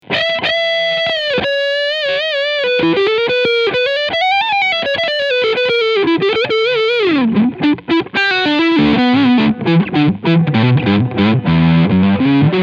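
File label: Gibson Les Paul Tribute STB Leadsound Neck Pickup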